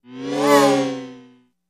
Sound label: Buzz By Quick; buzz & hums